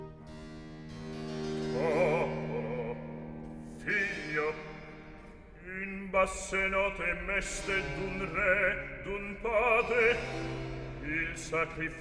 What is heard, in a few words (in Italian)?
Recitativo accompagnato; Popolo, figlio; Handel Masterworks; Act I